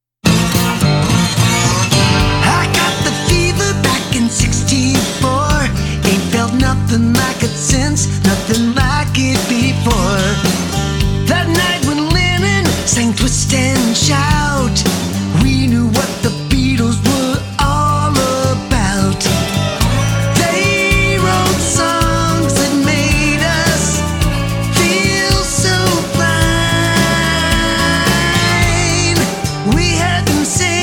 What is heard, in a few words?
Beep Beep